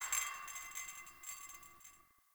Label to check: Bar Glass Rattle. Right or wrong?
Right